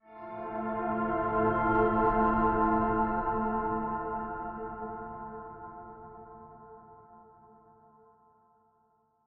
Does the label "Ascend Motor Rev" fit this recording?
No